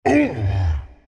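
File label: pain, Chinese; Chaknight; dota2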